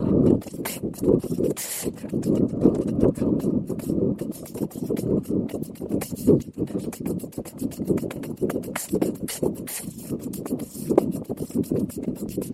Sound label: drums summed